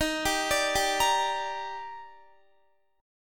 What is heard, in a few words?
Eb Major 7th, Guitar; x,18,19,19,19,18; 4F,4A,5C,5G,5C,6E; arpeggiated